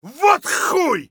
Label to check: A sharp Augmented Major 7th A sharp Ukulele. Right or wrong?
Wrong